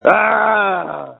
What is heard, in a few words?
Scream